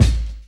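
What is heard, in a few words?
BASS DRUM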